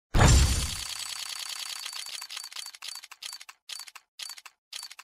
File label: open